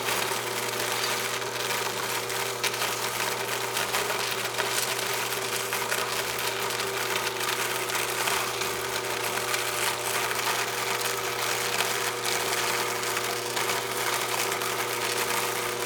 INDUSTRY MACHINE Cacao Workshop, Grinder A, Running, Room Amb; Cacao Chocolate Factory; LOOP; Articulated Sounds